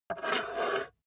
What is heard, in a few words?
metal scrape on wood